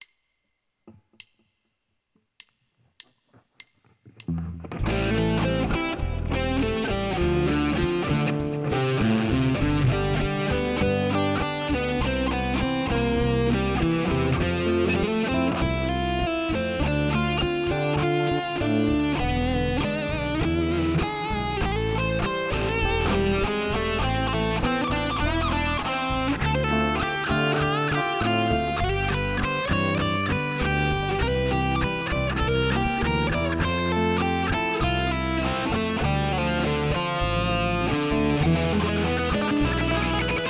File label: Rock Guitar Solo